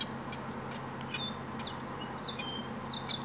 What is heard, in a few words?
at river